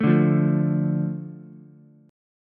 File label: A Suspended 4th Sharp 5th, Ukulele; Asus4#5; strummed; 2,2,1,0; JGuitar